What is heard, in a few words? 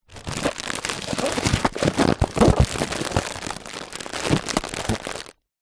foley inflated plastic bag handling